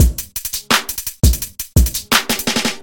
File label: Loop Rap Beat